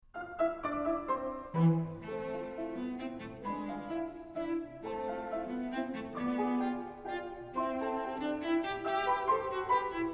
Cello Sonata 1 In E Minor Op 38 2 Allegretto Quasi Menuetto; Brahms; Rostropovich